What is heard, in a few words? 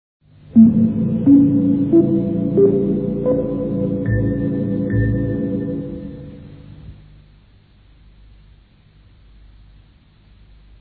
interval signal; ndr c1975